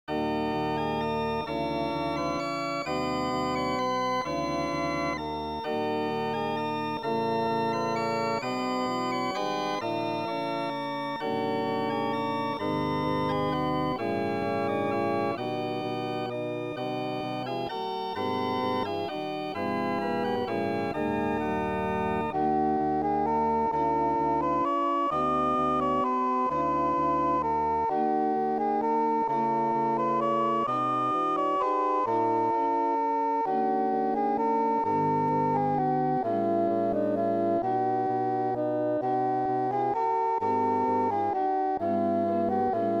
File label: Septimal Meantone Organ Pipe